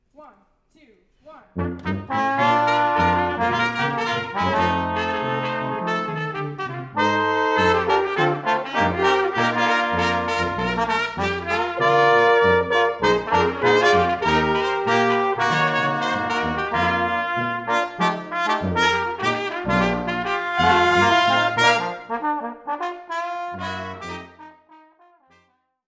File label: Brass Quintet